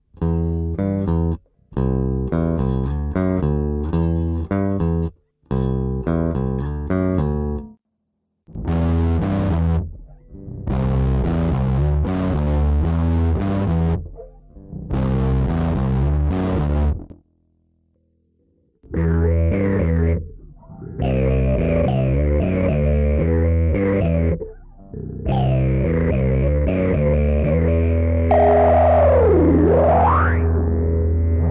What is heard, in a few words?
Synth Style; bass